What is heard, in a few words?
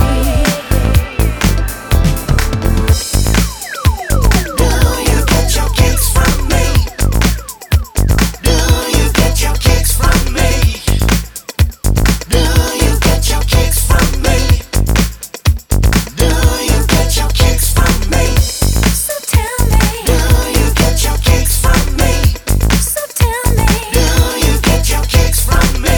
kicks